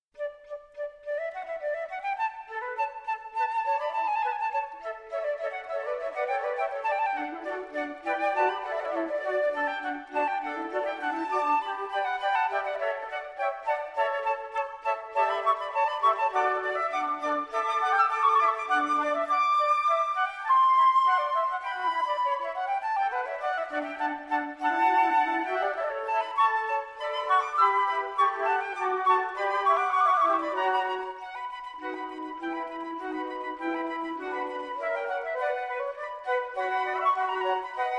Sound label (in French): Concert Pour 5 flute Traversieres sans basse D dur Allegro; Op153; Joseph Bodin de Boismortier